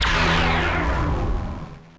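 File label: Laser Fire Exterior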